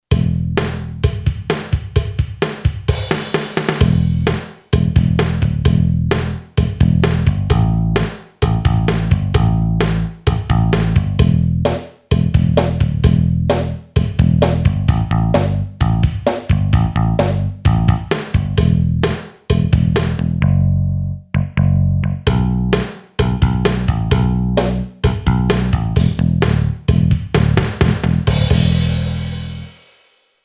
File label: ROCK, Boss DR-3